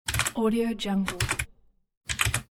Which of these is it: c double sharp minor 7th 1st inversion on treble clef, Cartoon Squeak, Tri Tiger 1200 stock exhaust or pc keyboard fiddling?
pc keyboard fiddling